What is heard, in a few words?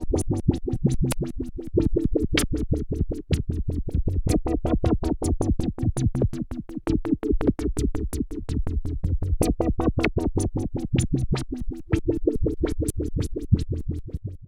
DUAL LFO TRI SAW shape; GRP Module